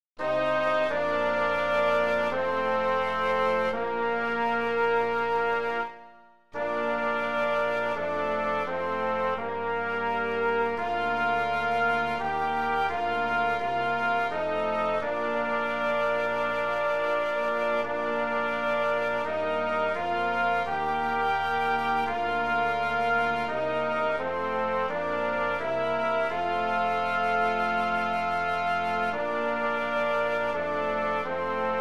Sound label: Abide With Me playback